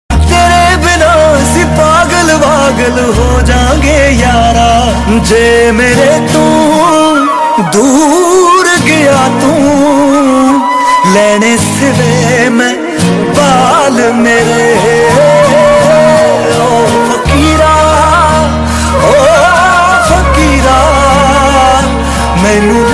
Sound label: Sad Punjabi Song Ringtone